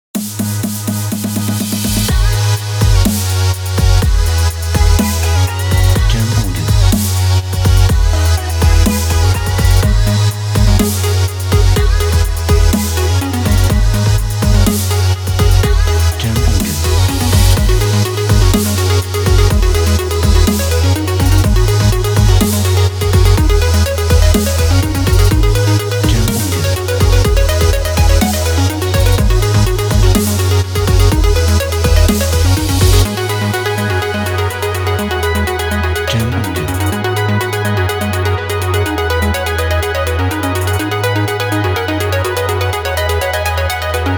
Upbeat Sport EDM

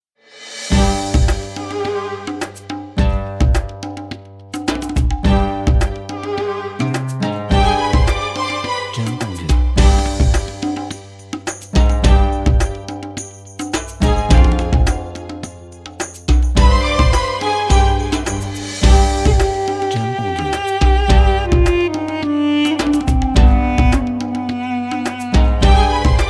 Arabic Middle East

